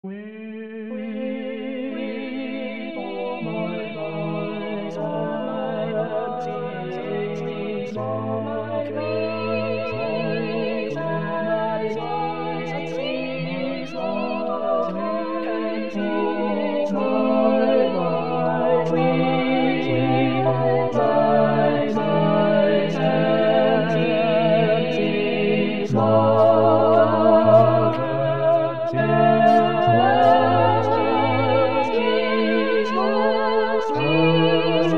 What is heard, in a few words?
ensemble weep o mine eyes